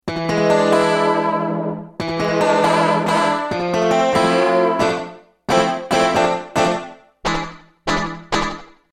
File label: FREAKY GTR